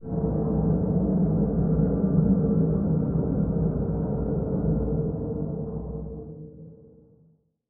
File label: black hole planet; Stellaris; machineage; planets